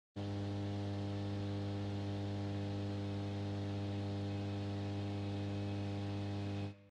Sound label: Fluorescent tubes